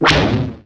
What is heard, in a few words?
x-wing laser; vaisseaux